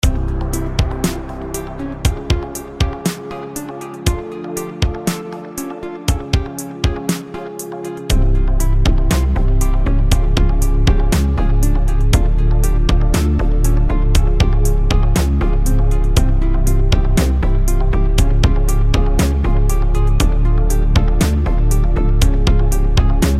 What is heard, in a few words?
Ambient Menu Theme